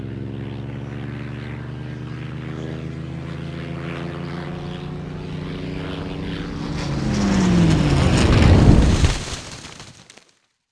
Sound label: plane crash flight; ambient; World of Tanks Blitz; neptune